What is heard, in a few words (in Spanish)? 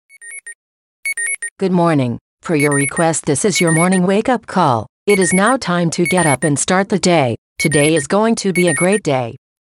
siri alarma despertador